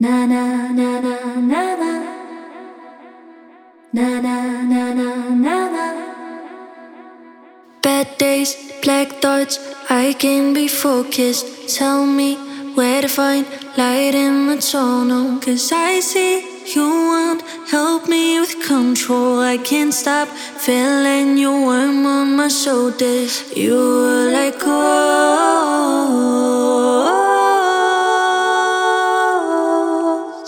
Vocals Full, Bm

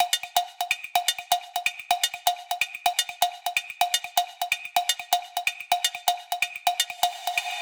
Perc Loop Afro 126 Fm

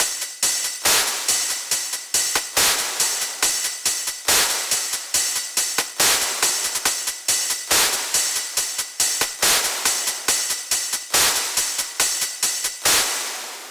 Top Loop 140bpm

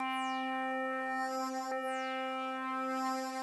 SYNTH DIGI

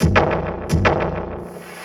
DARK DRUM 130

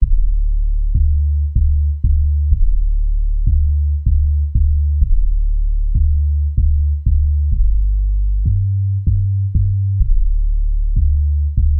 Bass

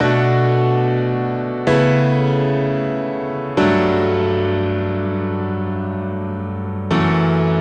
Poly Piano 126 Bm

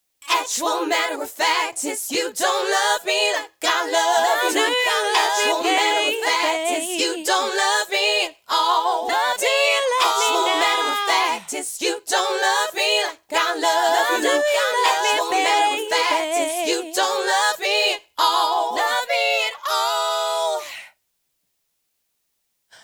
99 E Full Acca Fem Voc

